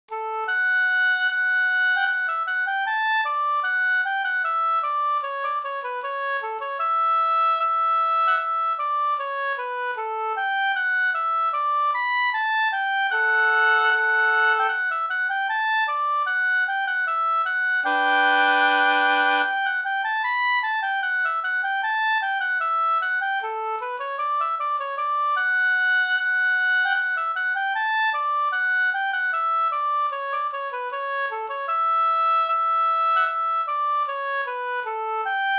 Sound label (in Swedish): brudmarsch; Brittas brudmarsch av Sven Forsberg